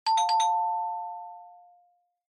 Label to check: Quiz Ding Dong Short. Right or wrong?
Right